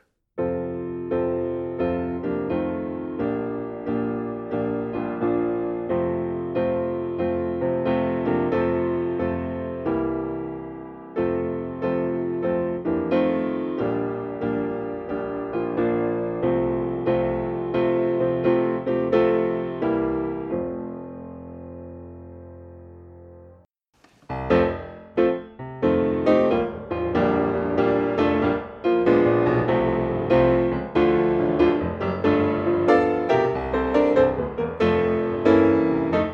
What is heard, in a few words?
Gospel piano Old style